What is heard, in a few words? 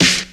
clean acoustic snare sound f sharp key